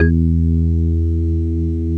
METAL B

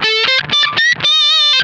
BIG LICK, Funk Guitar; Zero G - Funk Guitar

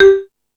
Percussion

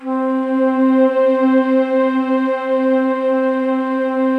STR K-2000, STRING; Best Service Gigapack 1

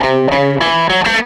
SG Guitar Loop

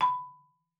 marimba B5; Xsample VOL-4

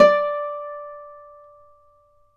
Celtic Harp, Plucked Strings

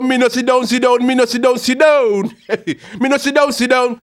HAL RAGGAM, RAGGA-MUFF A maj

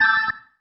Stab, Organ, One Shots

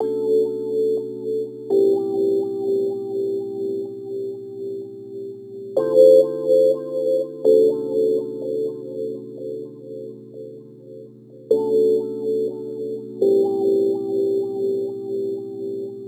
Rhodes Attacks; Ueberschall

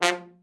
trombone; Best Service; Killer Horns

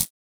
Household Perc

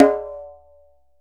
DJEMBE, African Perc; E-MU Producer Series Vol. 7 – Old World Instruments

